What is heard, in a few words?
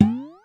Pitched tins, Foley; musicradar; cartoon caper